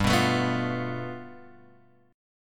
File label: G 9th, Guitar Open G